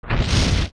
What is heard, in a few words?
Peahat Sprout; OOT